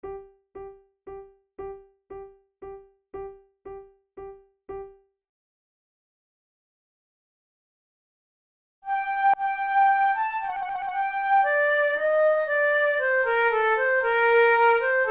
two flutes air; Monteclair; 6 concerts; flute tunes

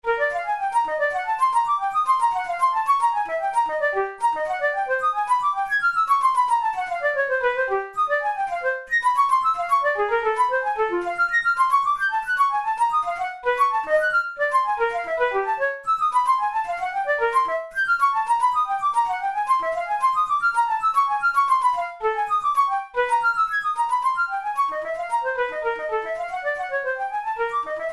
studies for flute solo; karg-elert

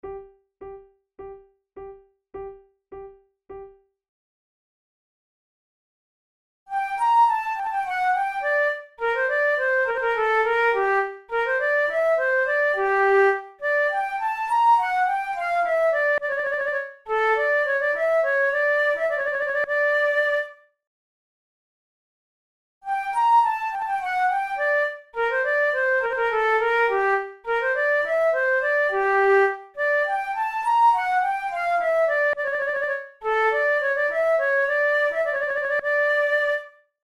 allemande, flute

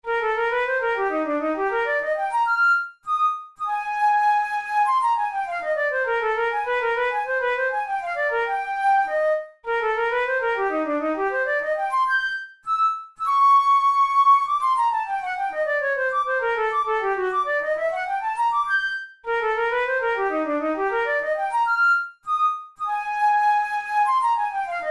gavotte; kohler op66

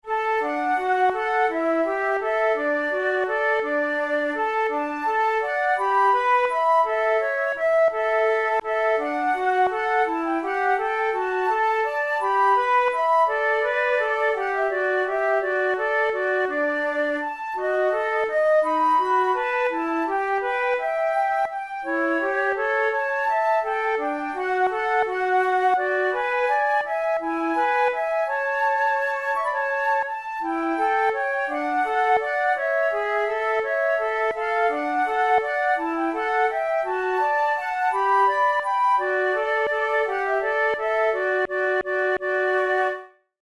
duet, flute

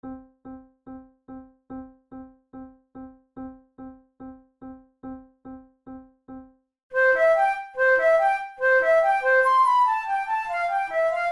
duet

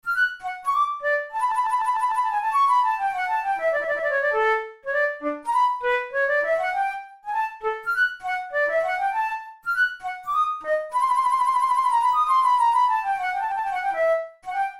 trills and appoggiaturas; kohler op66

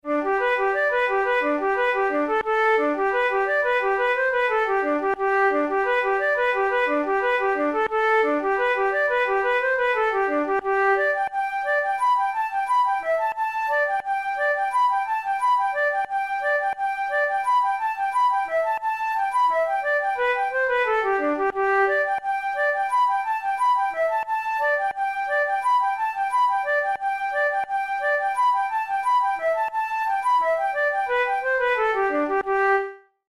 reel, flute tunes

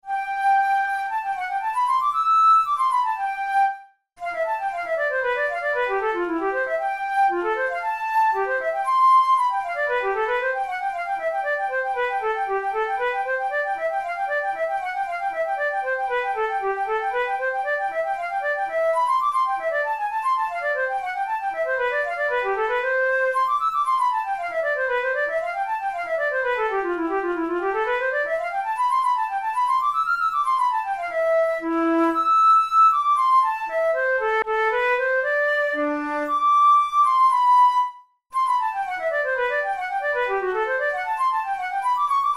methode pour la flute study; drouet

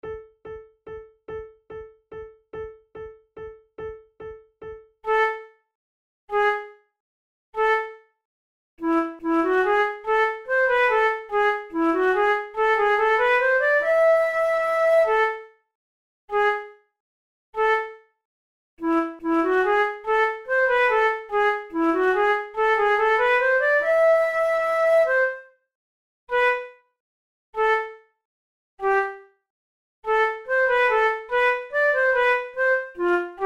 concerts for two flutes; Monteclair; langloise; flute tunes

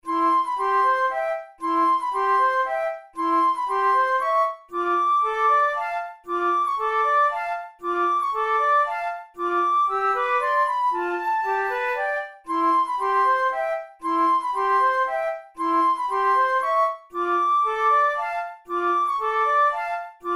methode de flute; tulou

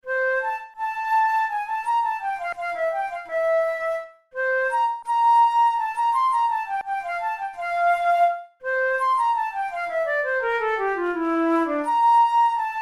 studies for the boehm flute; drouet 72

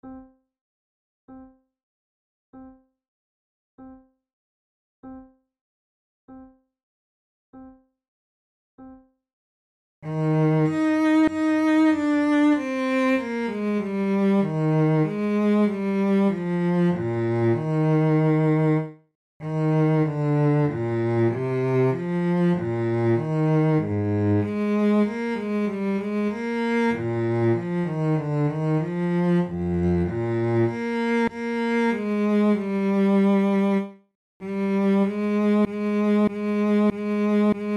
adagio, flute

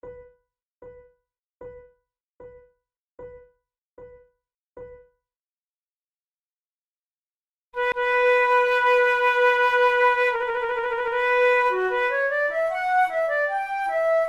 concerts for two flutes allemande; monteclair

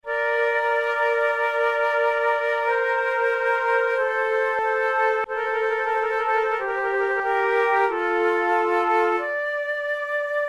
flute sonata largo; Mattheson; flute tunes

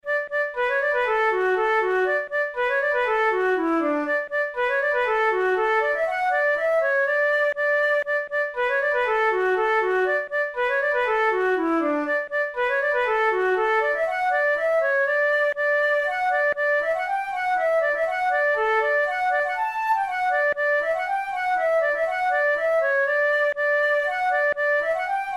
polka, flute tunes

